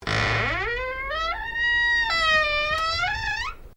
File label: Door Pivot Interior Wardrobe Louverd Squeak; Miscellaneous Doors